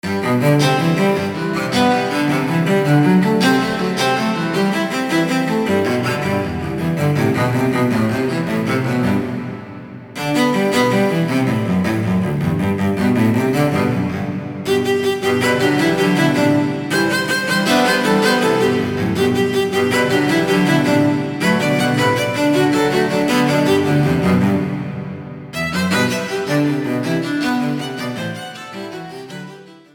Cello Duet

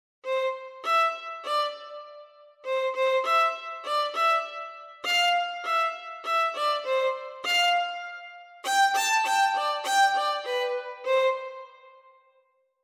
Violin Articulations Marcato

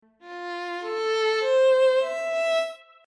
G Clef Spaces

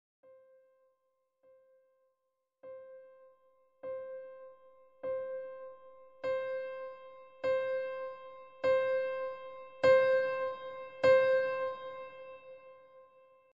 Dynamics Piano pppp to ffff

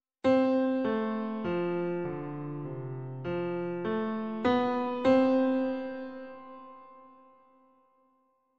Variable Dynamics With Hairpin pp

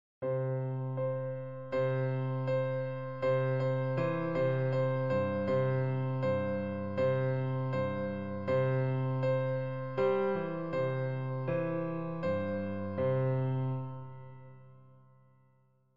Changing Time Signatures

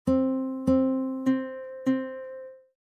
4 4 Quarter Notes

